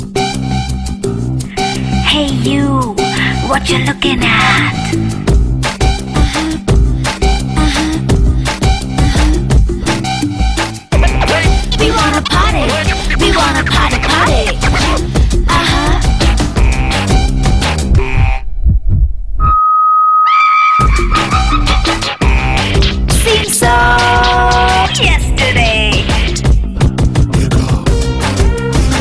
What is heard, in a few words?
Music